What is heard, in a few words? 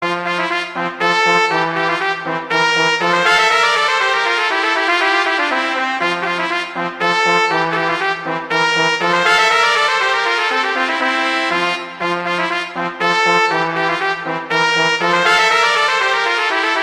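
Duet, horn; Mozart; Duets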